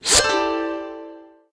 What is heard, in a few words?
Lantern Teleport In; Cut the Rope Holiday Gift; android ios mobile gamerip